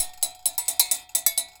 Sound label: bulu grooves; Best Service; World Colours